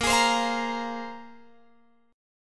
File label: Bb 7th Suspended 2nd Bb, Guitar Open F; Bb7sus2 strummed; x,17,20,19,20,17